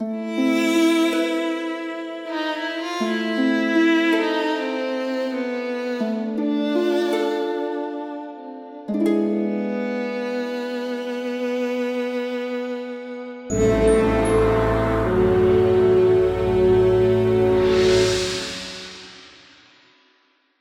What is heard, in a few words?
Lounge Intro; Tintin Reporter - Cigars of the Pharaoh